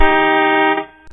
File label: horn